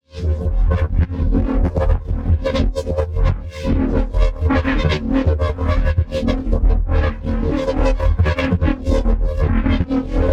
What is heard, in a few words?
PARTICLES LARGE FAST Synthetic Struggle; NEON; boom library